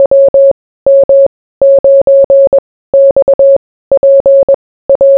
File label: morse; lightsail-2; WM9XPA